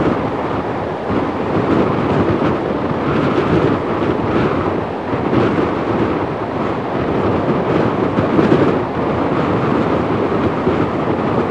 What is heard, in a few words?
fast wind loop, vehicles; Half Life 2